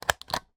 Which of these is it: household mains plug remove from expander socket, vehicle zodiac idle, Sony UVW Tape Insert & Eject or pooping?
household mains plug remove from expander socket